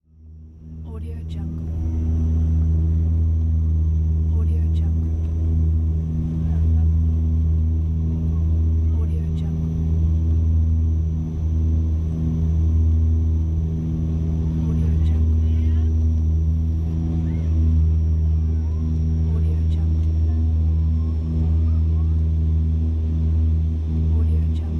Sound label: commercial propeller plane onboard